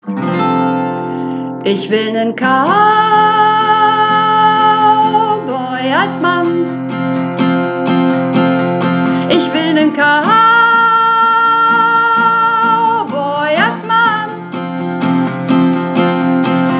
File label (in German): Hauptstimme, Uebungsaufnahme